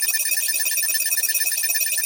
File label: data analysis, anime